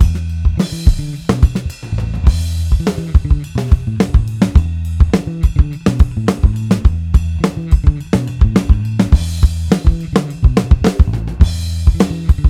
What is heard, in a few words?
Drum Bass